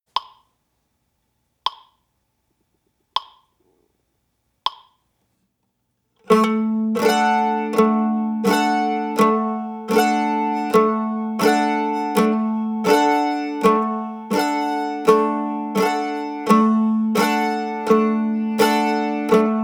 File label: Deluxe Strum